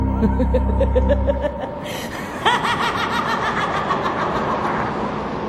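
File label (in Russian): жуткий смех женский резкий мелодичный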